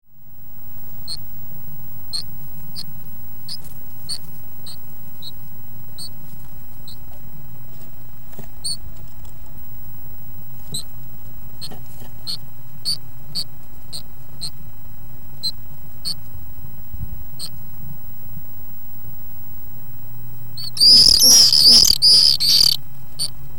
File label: swift pm